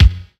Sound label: trebly bass drum F sharp key; kick drum; kick drum samples